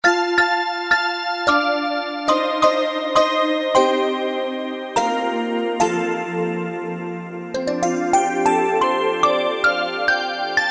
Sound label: Mbira Stack, Kurzweil K2000; Kurzweil K2000 Contemporary ROM